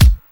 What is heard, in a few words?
fresh acoustic kick sample G sharp key